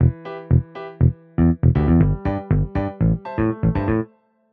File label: funk groove